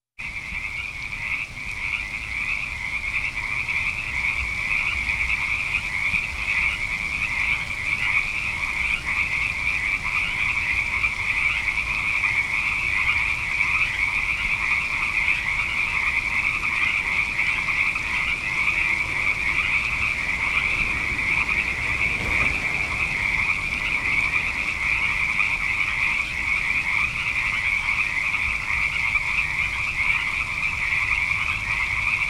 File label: Frog Choir